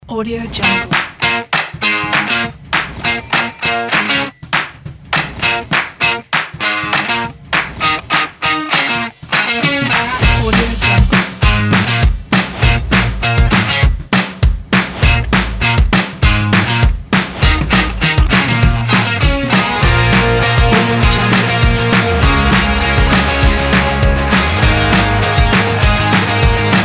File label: energetic and upbeat bright